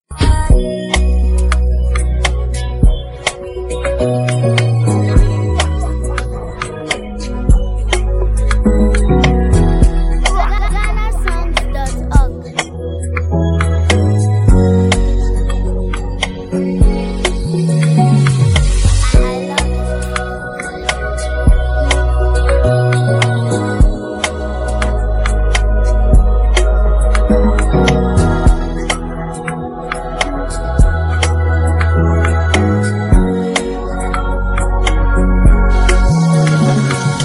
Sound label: Instrumental